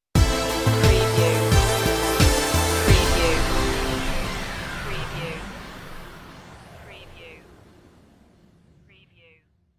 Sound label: falling blossom stinger